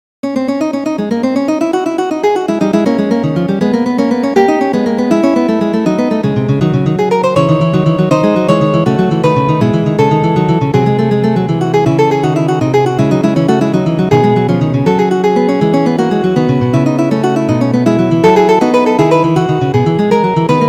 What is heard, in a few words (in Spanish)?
fuga; bach johann sebastian; clave bien temperado 2